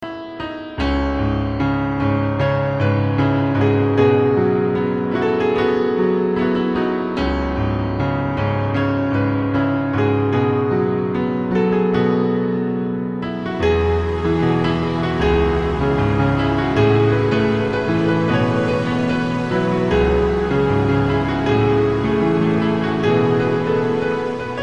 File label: piano